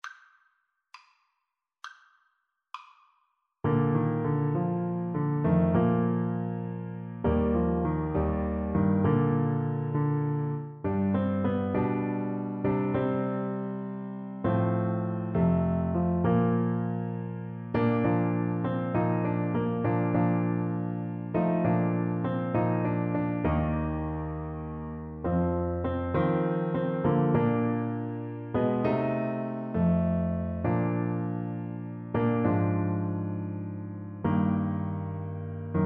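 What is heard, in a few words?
eye is on the sparrow, alto saxophone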